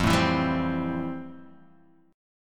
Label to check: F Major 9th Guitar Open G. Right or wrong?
Right